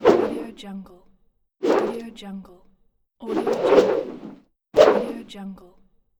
whoosh sounds

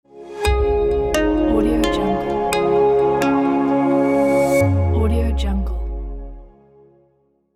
clean company logo revealing

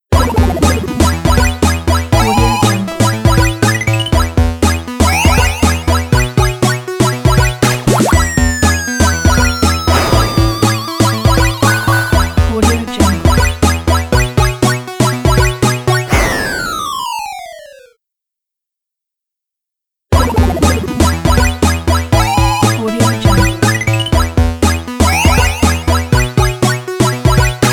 8bit arcade logo

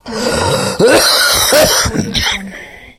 cough covid; albasfx

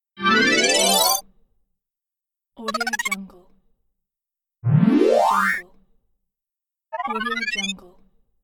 arpeggios as powerups

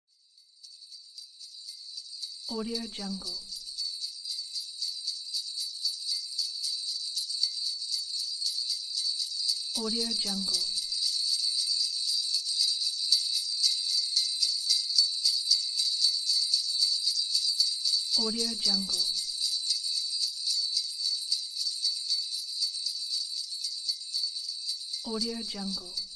sleigh bells

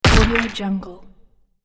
golem or stone troll hit; sonic-boom

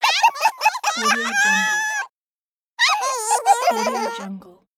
minion laugh

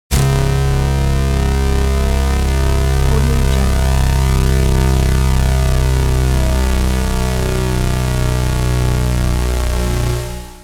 musical synth bass drop slow long

